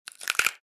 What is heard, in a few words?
Bone breaking